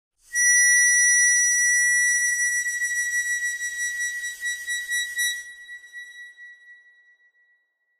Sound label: Mouth-organ, Accent, Type 2 - High Tone, Reverberant; woodwinds